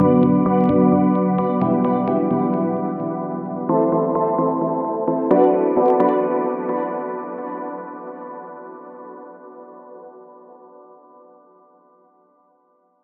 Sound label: Synth